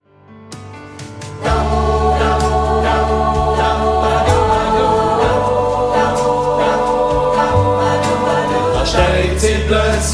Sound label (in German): Heimweh SATB